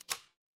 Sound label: ui toggle